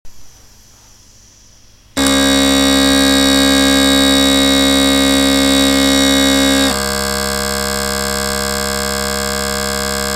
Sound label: C sharp Drones